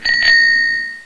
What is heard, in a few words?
bicycle bell; prop hunt x originals